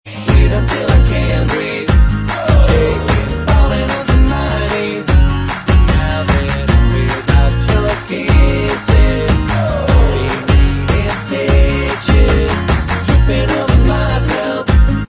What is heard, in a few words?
Bvox Track, backing tracks